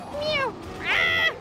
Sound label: dies; noises; NekoRyS